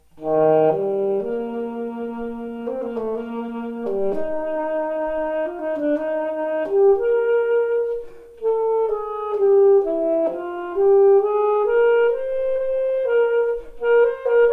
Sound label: F Major alto